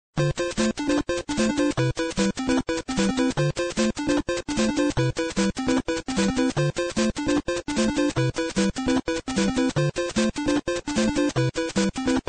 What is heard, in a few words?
Star Power; Mario